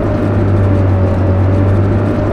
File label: low, vehicles; tralalelo ferrari; sim fphys fer laferrari; salon